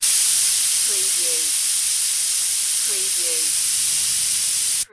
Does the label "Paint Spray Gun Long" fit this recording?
Yes